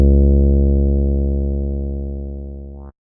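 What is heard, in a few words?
Poly Bass, 88, F minor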